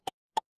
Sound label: ui alert